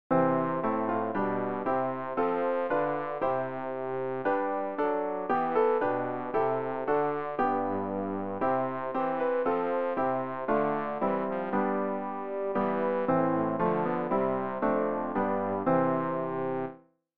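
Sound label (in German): bass, Übehilfen; Ref. Gesangbuch; RG 181: Bi de Touffi chömed miir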